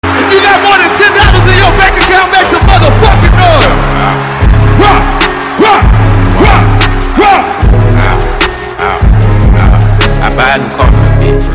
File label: concert